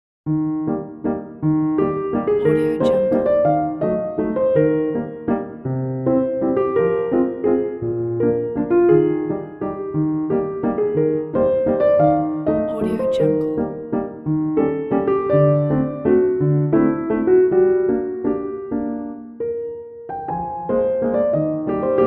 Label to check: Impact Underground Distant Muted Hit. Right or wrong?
Wrong